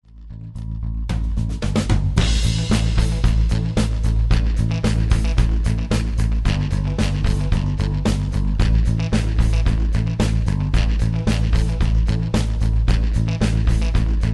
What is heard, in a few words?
rock delay